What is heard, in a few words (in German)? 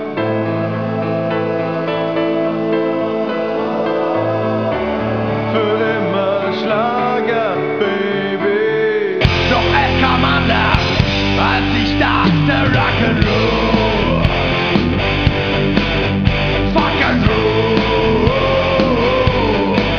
schlager; Schlagerkönig